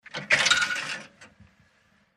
Brit Call Box Push But, foreign pay phones coins

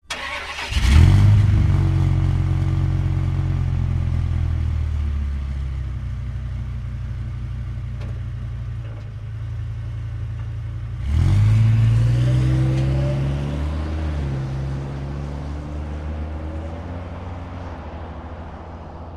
Dodge Ram Start Idle M

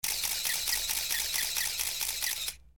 Camera Shutter Moto; shutters & movement

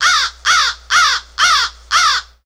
Crow Caws Classic X5

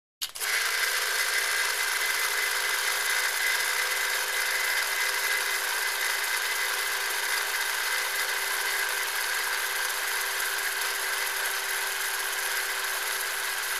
Film Chatter 16 Mm Ca, cameras

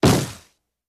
Camera flash, flash pot, sharper; cameras

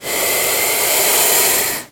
Lizard, Water Monitor Hiss. Close Perspective.

